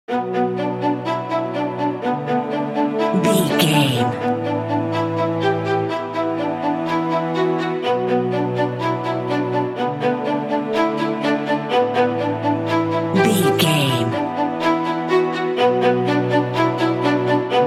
inspirational cinematic beauty and elegance strings mix